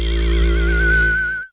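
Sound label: player spawn; AIWars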